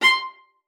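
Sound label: C5, viola spiccato; Xsample VOL-5; 90 s Sample CDs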